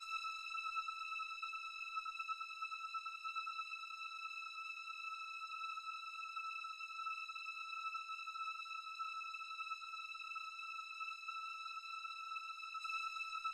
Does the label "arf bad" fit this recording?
No